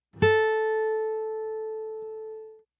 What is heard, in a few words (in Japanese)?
hiA ギター